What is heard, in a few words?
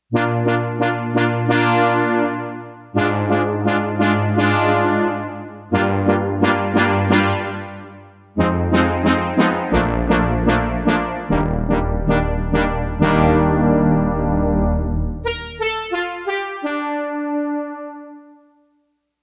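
Reverb Brass, Yamaha TX802; Factory Patches; Voices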